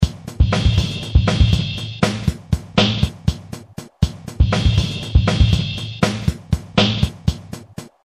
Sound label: X Track Alien Rhy